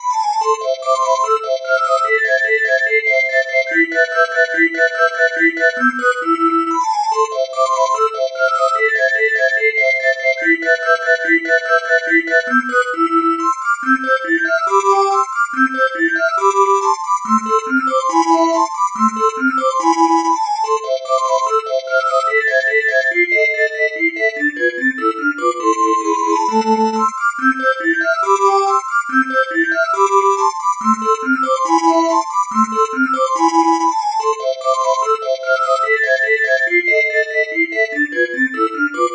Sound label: metal pad